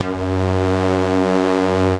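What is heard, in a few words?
Synth F minor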